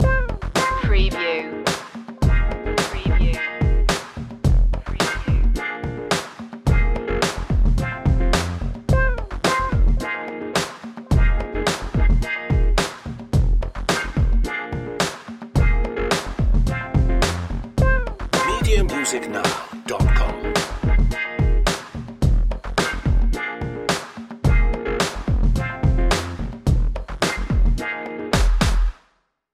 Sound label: sexy funk groove